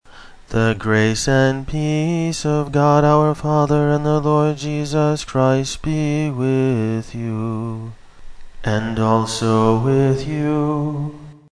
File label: Greeting, chant, english